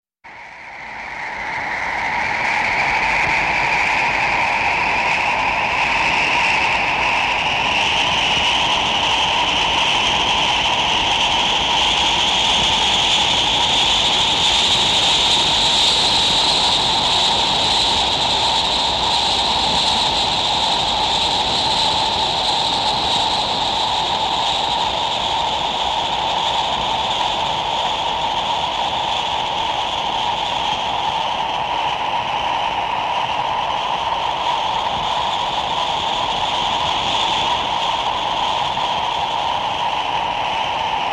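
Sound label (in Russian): ветер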